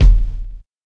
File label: long tail reverb kick single shot d sharp key